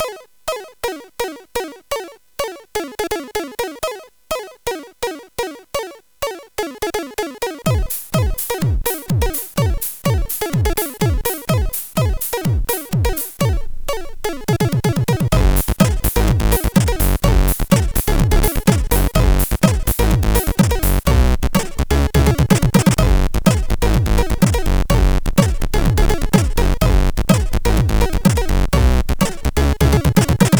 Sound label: Funky Groove; CSG8580R5; sid; hvsc